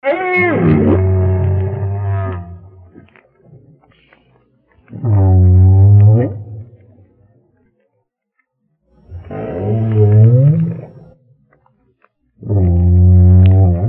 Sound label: whale in australia